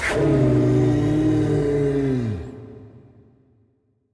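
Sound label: monster die roar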